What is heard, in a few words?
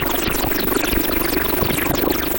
Fireworks Bubbling, Noise Percussion; Sonic Foundry (Sony Creative Software); Ma Ja Le Ethereal Textures